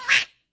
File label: bird collision; sfx